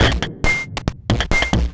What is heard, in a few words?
prc 138, percussion loops, Techno; PS-45